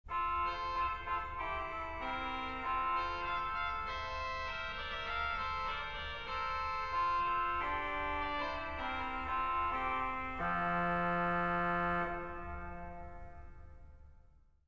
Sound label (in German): oboe